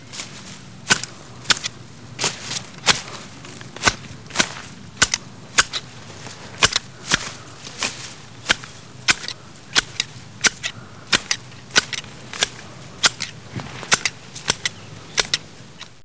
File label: Cutting Grain; How Would You Survive (1995); Grolier